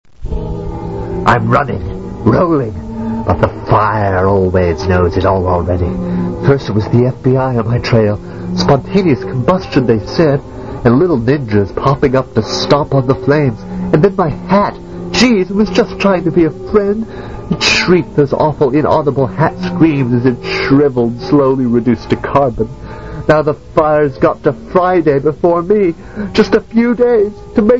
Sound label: running